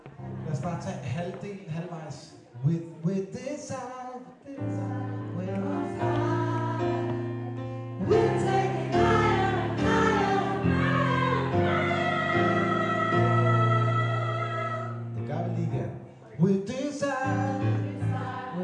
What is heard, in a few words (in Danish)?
brand new start refresh sopran